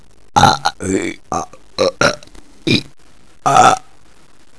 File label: Burp; worms